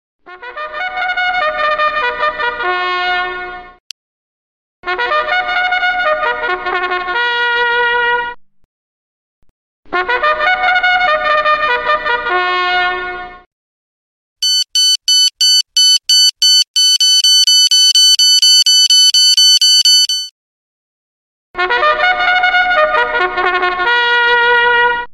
Loud Alarm Ringtone

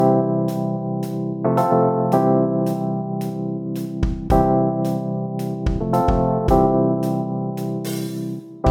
Galaxy K Zoom Sms Samsung

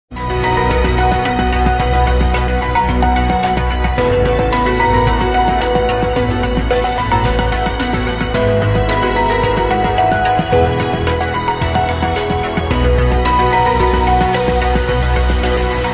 Samsung Galaxy A14 Official Ringtone

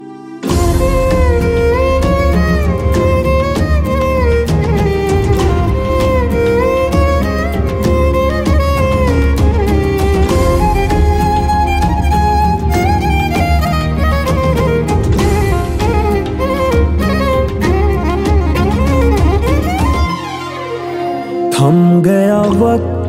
new punjabi songs ringtone

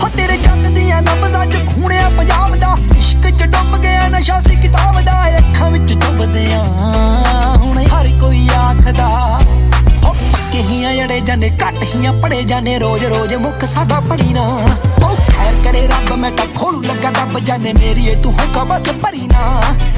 Punjabi Song Ringtones

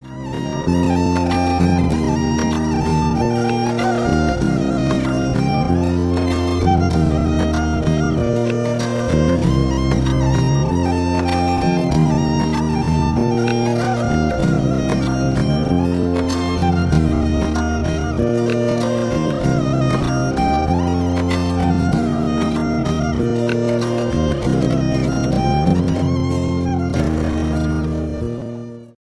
Sad Violin Ringtone

